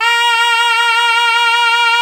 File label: mizmar